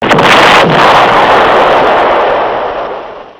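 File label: explosion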